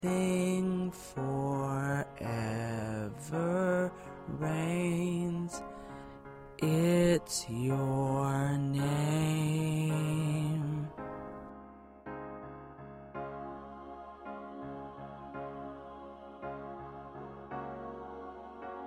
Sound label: Lead